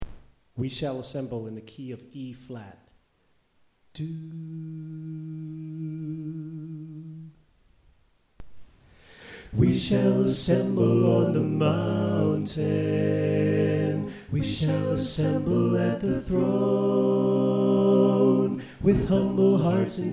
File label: Soprano Left